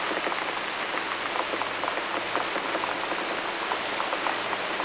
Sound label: rain; DAoC